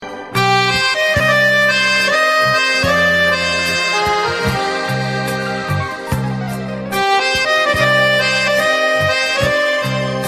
saxophone ringtone

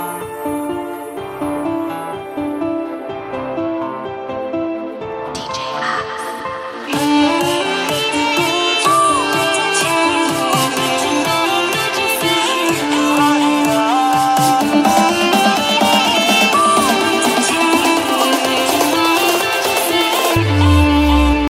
bollywood music ringtone

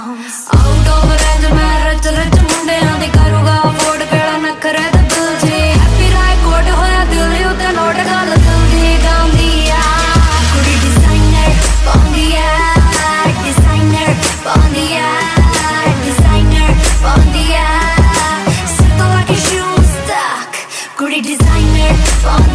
punjabi ringtone

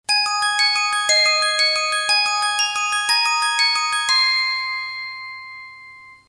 nice bells ringtone

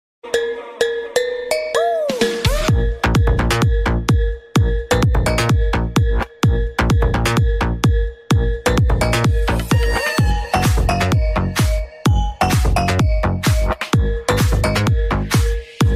western music ringtone

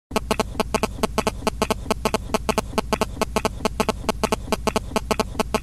mobile signals ringtone

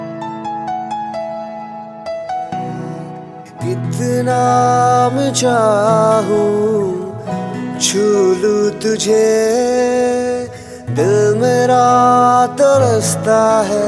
hindi christian song ringtone, christian gospel

